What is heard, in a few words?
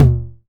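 909 mid tom; Goldbaby Tape808 & Tape909; The Tape 909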